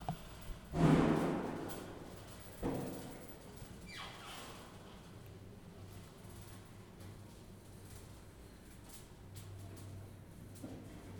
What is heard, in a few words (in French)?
ascenceur; Ville; ZoomH2N